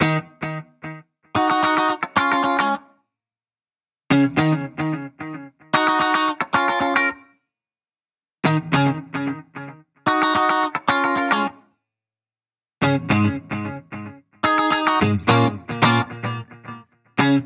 Guitar Bpm110 Key D# minor Full, 70s Guitars Full Mixes; Fox Samples; Back to the 70s Guitars